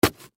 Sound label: Cardboard Box Knife Stab Hard; Foley; Materials; Blastwave